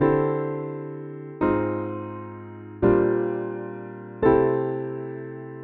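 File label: jazz keys, acoustic piano, 85bpm, D; Chordy Loops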